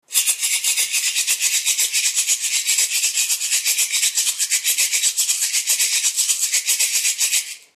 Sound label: Flat Shaker Smart